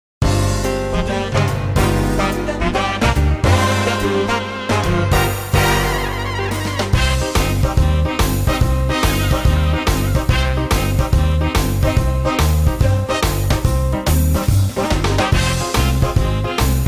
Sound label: karaoke